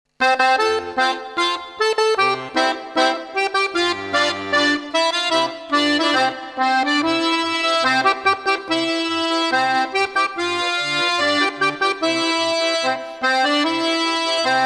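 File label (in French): Fete au village Jingle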